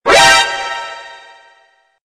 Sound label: Message Horror